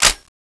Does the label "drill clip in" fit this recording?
Yes